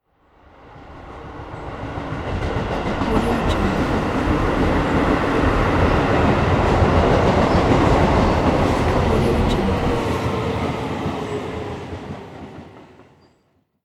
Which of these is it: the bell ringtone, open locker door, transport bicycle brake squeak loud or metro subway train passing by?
metro subway train passing by